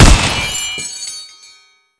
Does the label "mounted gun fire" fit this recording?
Yes